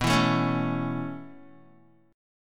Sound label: B 9th Suspended 4th B down Guitar; strummed